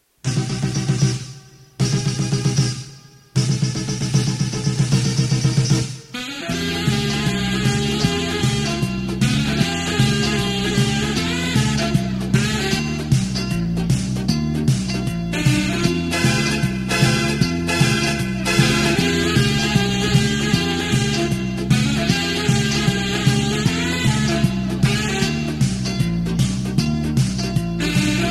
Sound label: instrumental